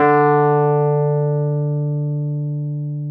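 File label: Wurlitzer electric piano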